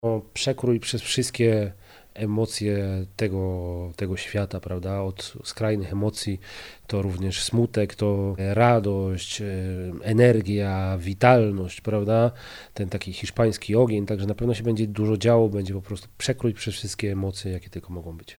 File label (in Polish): flamenco przekroj emocje